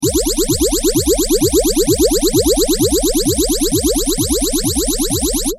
drumloop; boss ph3

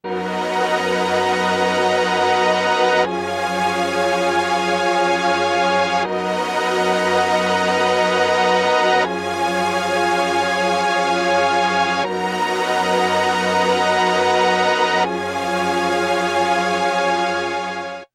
Stringtron, Moogerphaser

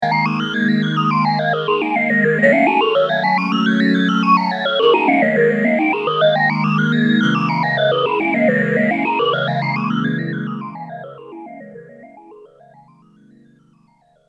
m1000 boss ph3

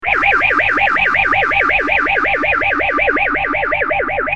gtr

